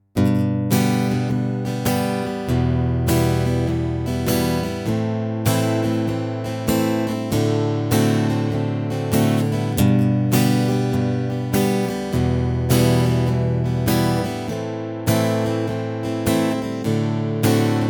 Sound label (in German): Anschlag, begleitung